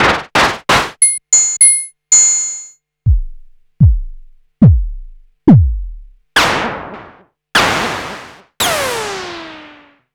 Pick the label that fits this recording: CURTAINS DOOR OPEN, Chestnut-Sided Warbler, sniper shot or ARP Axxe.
ARP Axxe